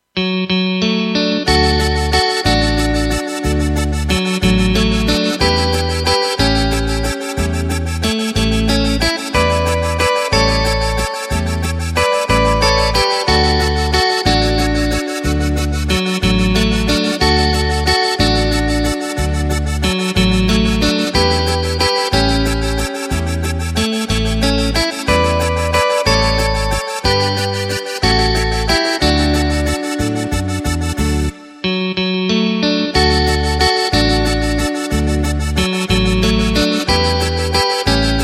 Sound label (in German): Walzer